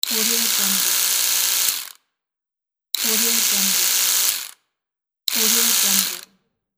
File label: electric toothbrush; mountainaudio